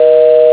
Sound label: phone busy